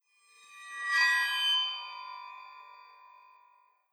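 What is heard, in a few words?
underground ambient; blackforest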